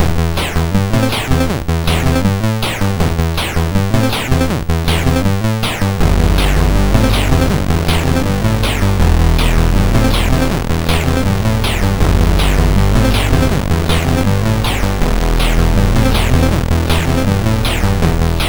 sid, MOS6581R3

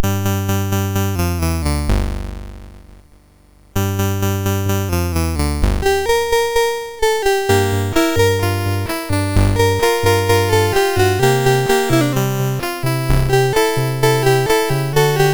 Rock and Roll, MOS6581R2; Jimi O

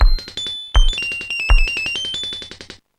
rap; Sonix Music Driver

